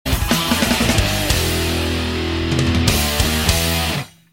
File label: outro guitar riff